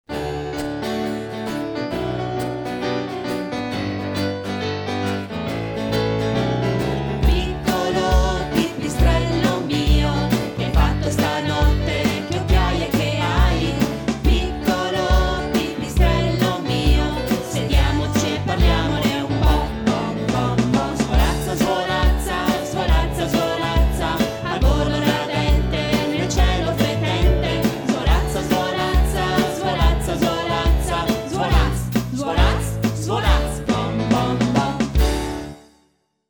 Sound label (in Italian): Piccolo Pipistrello